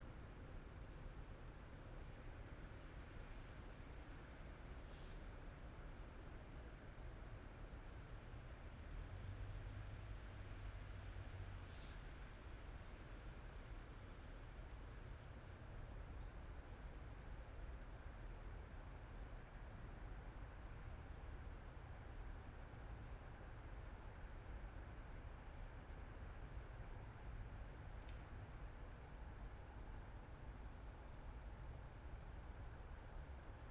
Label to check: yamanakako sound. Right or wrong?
Right